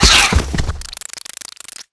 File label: Crab Die